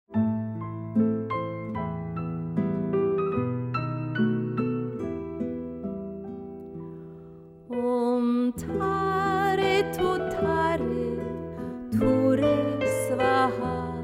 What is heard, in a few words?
Om Tare Tutare Ture Svaha